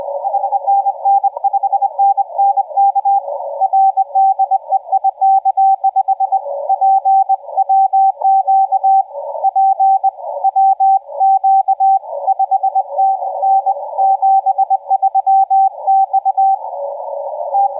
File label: 20m